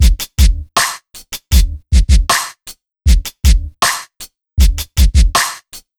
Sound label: BT; Ragga & Dub Reggae; USB Soundscan vol.27; AKAI